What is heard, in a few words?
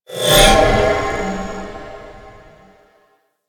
Energy Vampire Shockwave End; Warframe; Warframes; Trinity